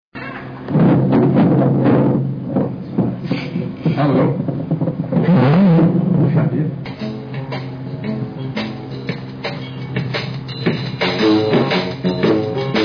sopro

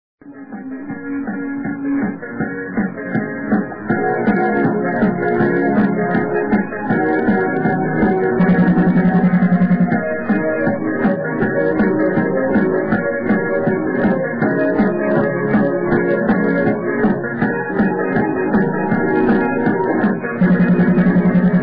batida

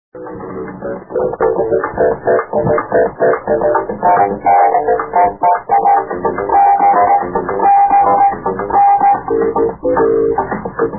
bat eletr

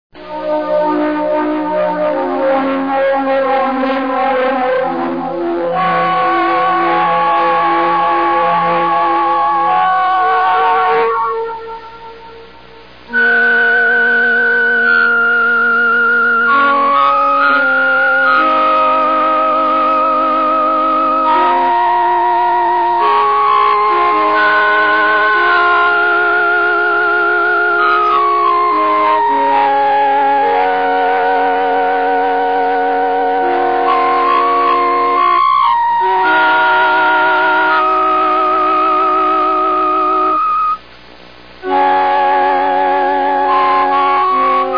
orgao

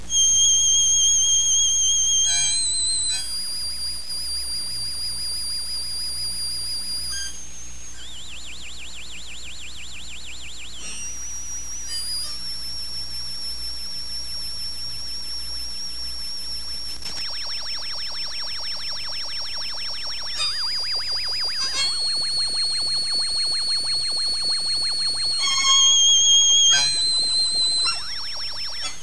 toque

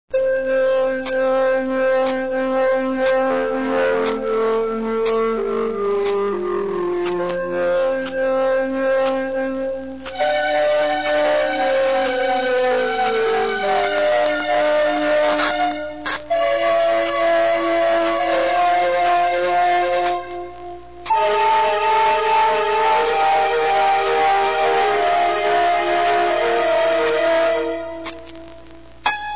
opus piano orgao

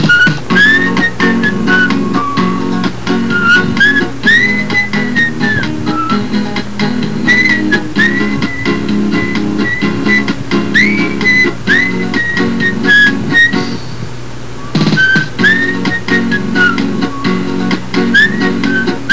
assobiada

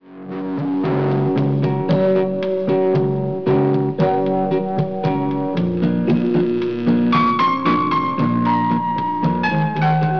balada piano

